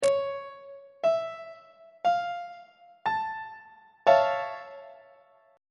e sharp augmented major 7th 2nd inversion on treble clef